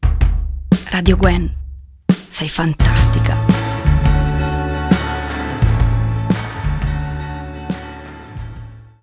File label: Jingle